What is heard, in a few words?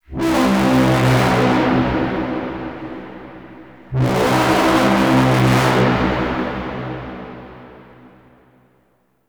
Modular Texture, Ambience; Warframe; Shadowgrapher